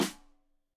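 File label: Snare Stereo, Drums; Deeply Sampled Drums; SMDrum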